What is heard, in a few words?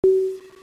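railcanon charge; weapons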